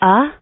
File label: alto "ah"